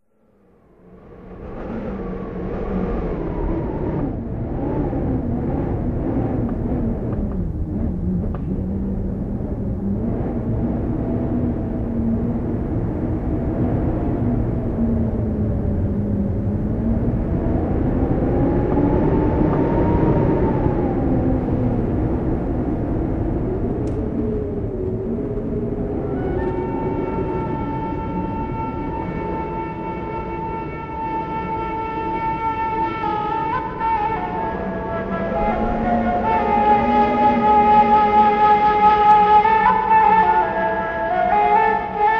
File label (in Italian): flauto triste